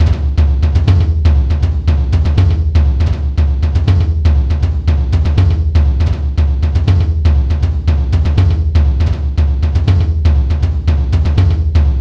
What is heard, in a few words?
drums